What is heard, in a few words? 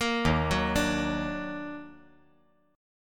Eb Minor Major 7th Eb down, chord; EbmM7; arpeggiated; JGuitar